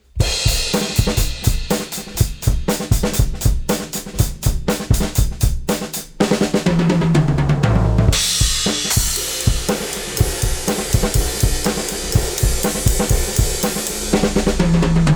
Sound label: drums; Pulsar 1178; Drum Bus Glue